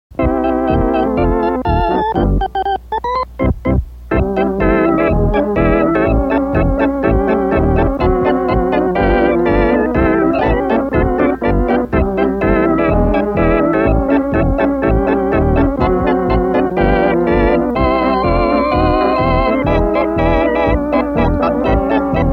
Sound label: instrumental